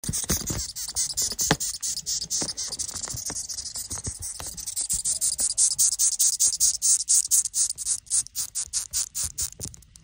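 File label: Moth, insect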